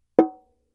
drum

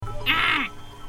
aghhhh

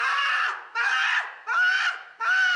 paaagggg pagggg paaaaa

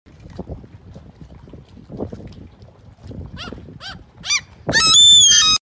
seagull screech

